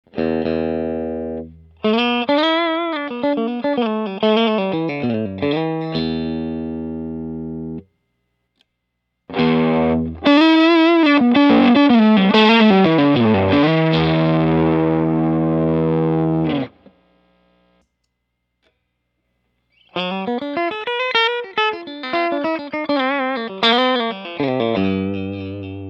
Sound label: Oxfuzz und Browntone Hoochie-Mama; Hoochee-Mama über einen Carr Mercury Verstärker; Gitarren-Effekte; Pedalmania Teil 1